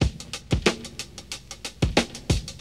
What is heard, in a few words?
SHUFFLE 92; 0- 99 BPM